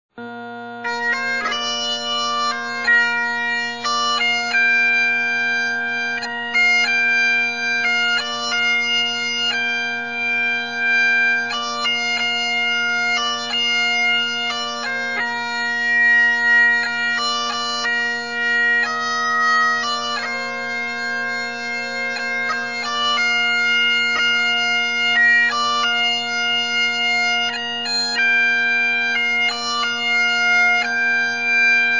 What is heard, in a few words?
bagpipes